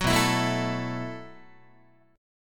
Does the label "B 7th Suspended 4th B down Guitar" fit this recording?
Yes